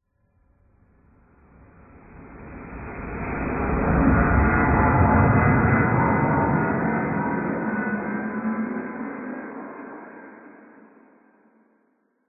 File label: sound design swell weird futuristic dark dull; zapsplat